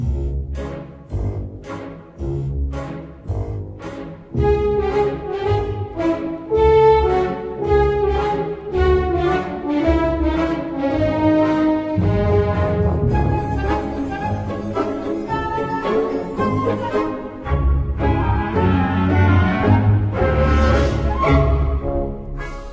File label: Spooky Lunapark Background